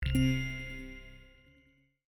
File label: chime